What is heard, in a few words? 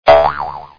funny sound notification